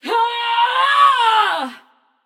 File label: battlecry passion; chaac